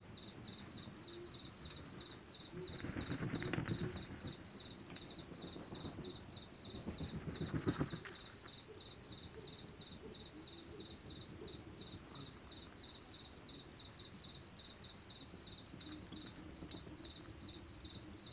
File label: Omani Owl Moment Of Discovery Compound Pulsed Hooting Of Male; Soliciting Call Of Presumed Femal